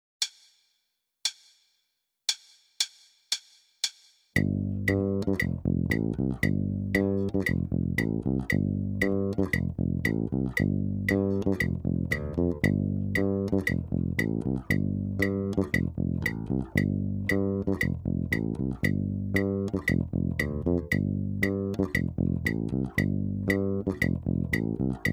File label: Rock Funk Rock In 1/8